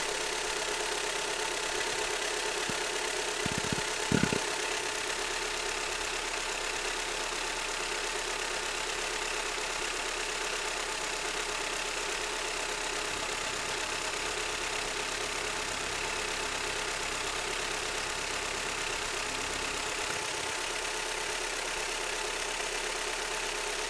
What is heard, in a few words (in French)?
moteur Laguna ralent